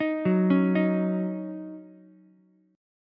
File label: D sharp Minor 7th, Ukulele; arpeggiated; JGuitar